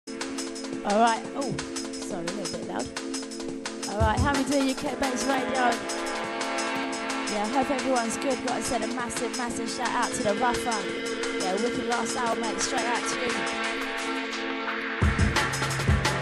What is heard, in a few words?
Drum and Bass Jungle and Old Skool; Mantra; The Rupture Sessions; UK Bass Radio